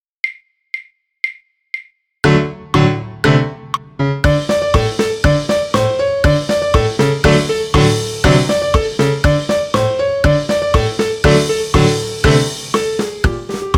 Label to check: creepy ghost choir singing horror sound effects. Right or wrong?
Wrong